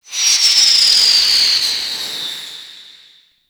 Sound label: Hazard Spark Projectile; Gameplay; Stadium; Warframe; Nineteen Ninety Nine